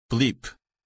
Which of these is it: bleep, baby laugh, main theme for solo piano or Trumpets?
bleep